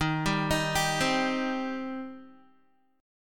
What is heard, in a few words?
Eb 6th Eb down Guitar; arpeggiated